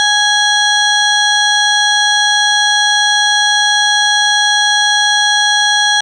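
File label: FM Sawtooth DX100 G sharp 2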